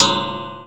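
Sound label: FRIDGE RACK, percussion metallic; Rarefaction; Poke in The Ear With A Sharp Stick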